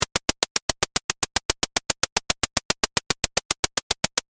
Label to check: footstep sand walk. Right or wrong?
Wrong